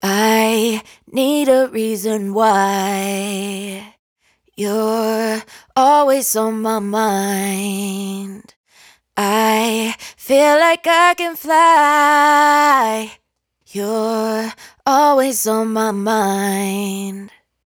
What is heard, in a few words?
hook 105 Dm, Dry Vocals; Raw Vocals; Slate Digital Omnivox Vocal Sample Pack